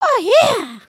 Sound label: Clap; emotes